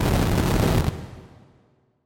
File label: spell fire; soundscrate